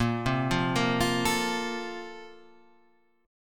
A sharp Major 7th Suspended 2nd, down

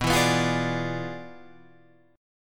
E 7th Flat 9th, B

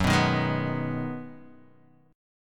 B 6th F sharp, guitar chord

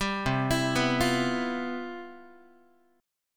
C Major 11th, Guitar, chord; CM11 arpeggiated

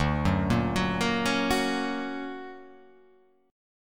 D Augmented Major 7th down, guitar

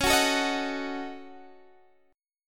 F sharp Minor 6th, Guitar

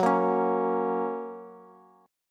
F sharp Major, Bass; strummed; JGuitar

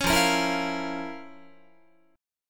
F Major 7th Suspended 4th Sharp 5th F, Guitar; strummed

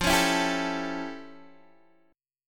F Augmented Major 9th Guitar DADGAD; 15,16,14,12,12,x

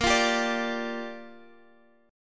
A sharp 6th, Guitar Half Step Down

